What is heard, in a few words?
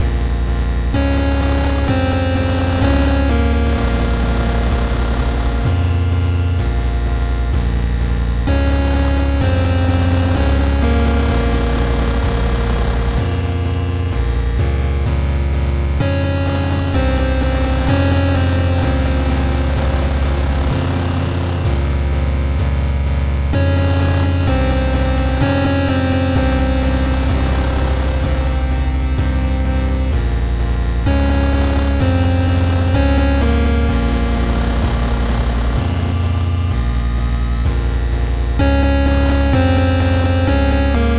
8Bit Theme Main Menu loop